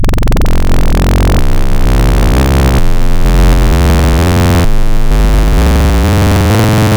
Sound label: Phase Shift Log, wavetables; Architecture 32